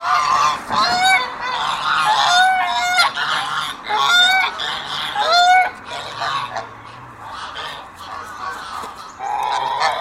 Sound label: Swan goose